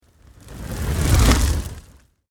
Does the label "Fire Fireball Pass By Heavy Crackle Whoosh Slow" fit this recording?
Yes